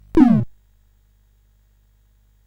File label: Dark Castle, CSG8580R5